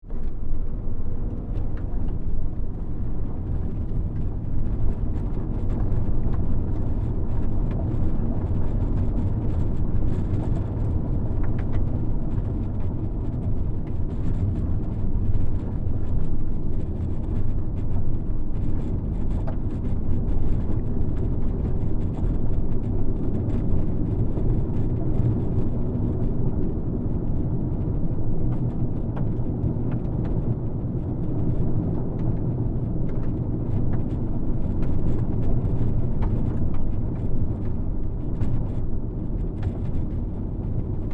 Car, Hatchback, Int Ride, Bumpy Dirt Road, Accel-Decel; Cars; Big Room Sound